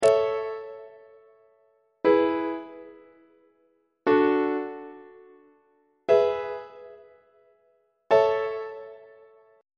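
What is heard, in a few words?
a major inverted imperfect authentic cadence; on treble clef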